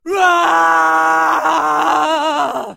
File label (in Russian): zvuki s krikom goriashchego cheloveka